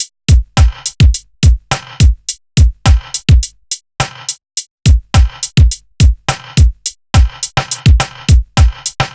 105 Smoove, Breaks, 105 BPM; loops; Loopmasters; Jon Carter & Alex Blanco; Block Party Essentials

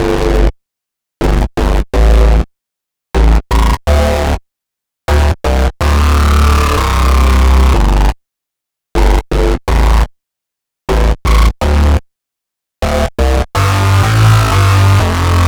124 Dm Bass, Electro Synths; Prime Loops; Complex Electro Synths; Invaders

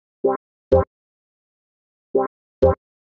126 C MUSIC; Loopmasters; Funky Underground Vol 2

